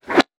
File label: weapon bullet flyby; Mono; Bullets; Real Guns Sound Pack 2